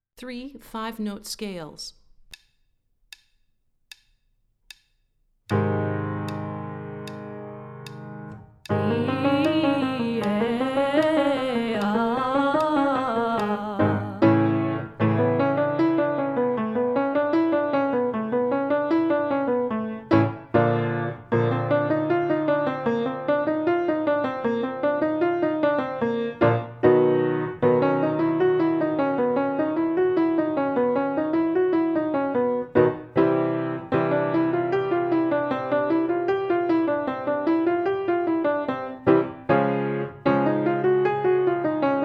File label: Three Five Note Scales Low